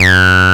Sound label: TB 303